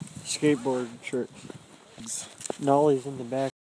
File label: dog